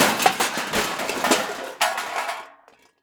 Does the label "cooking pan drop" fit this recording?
Yes